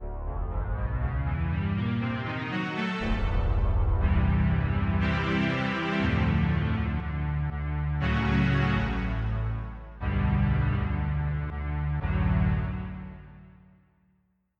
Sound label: Pro5 String Dark